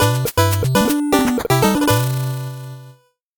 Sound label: 8bit jingle fast